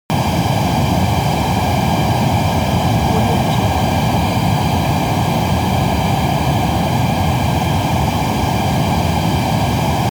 transportation jet military fighter turbine steady soft